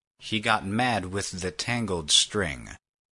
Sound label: string